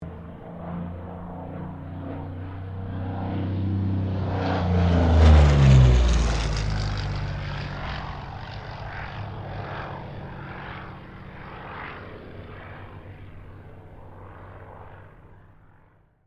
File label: AIRCRAFT P-40 KITTYHAWK PASS STEREO; P-40 Kittyhawk / Warhawk / Tomahawk; Airplanes